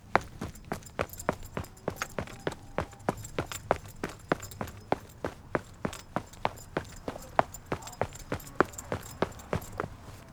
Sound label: Running Footsteps On Pavement